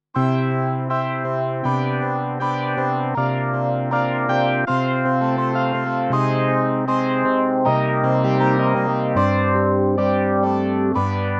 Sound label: K150 POP PNO